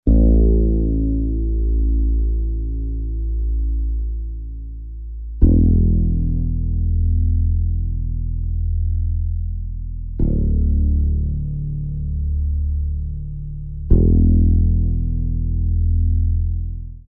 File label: Deep low frequency pulsating drone